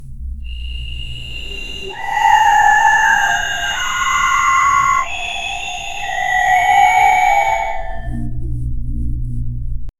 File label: spooky whispers ghosts